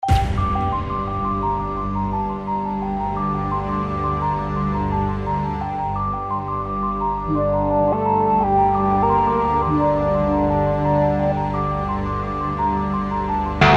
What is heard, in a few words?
ambience